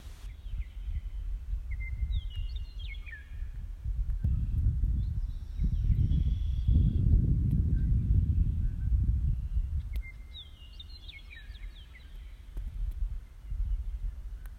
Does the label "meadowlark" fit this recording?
Yes